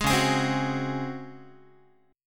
C sharp Major 7th Suspended 4th C sharp Guitar; C#M7sus4; strummed

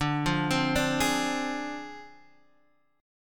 D Minor 7th Flat 5th Guitar Half Step Down

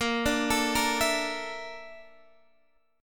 Bb Major 7th Flat 5th Bb Guitar Standard; BbM7b5 arpeggiated; JGuitar

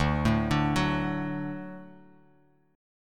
D Major D down; D arpeggiated; JGuitar

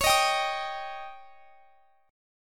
Db Suspended 2nd Flat 5th; strummed; x,x,23,20,20,23

